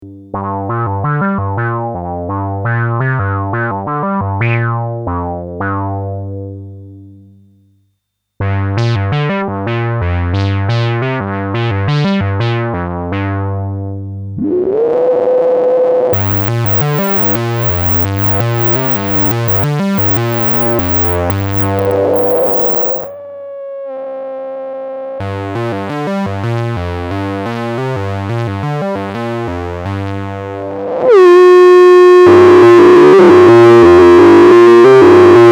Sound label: Saw Cutoff Reso Env Follower smooth Cutoff Reso Feedback